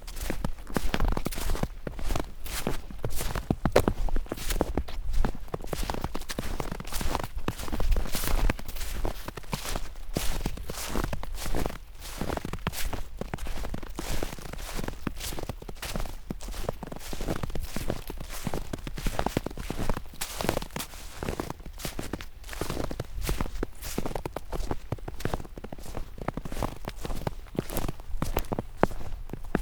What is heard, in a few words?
Snow Footsteps